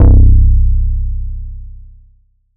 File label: BASS (C); Reaper; Cymatics; Orchid Sample Pack; 808s & Basses